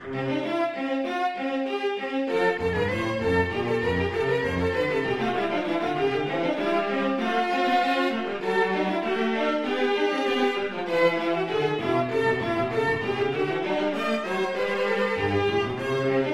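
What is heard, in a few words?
City Built Theme Orchestral, MIDI; Civilization SNES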